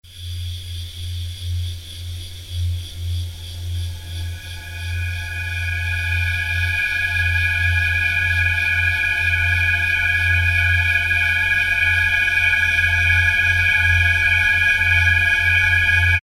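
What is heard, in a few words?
atmo background synthetic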